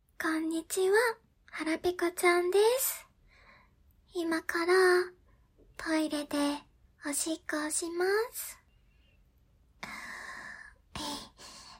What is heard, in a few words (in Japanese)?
おトイレで！オシッコですッ！